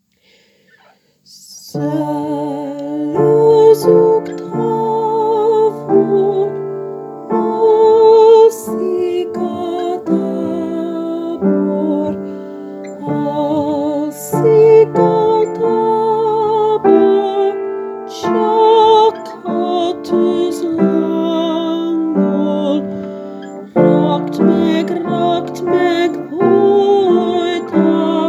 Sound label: Soprano; Tabortuznel